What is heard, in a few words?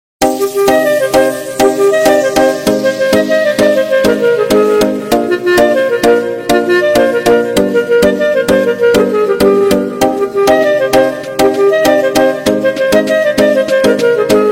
arabic ringtone bgm